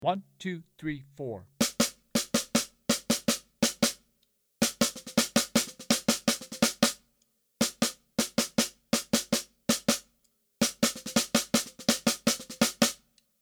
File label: Straigth 8ths Adaptive Overlay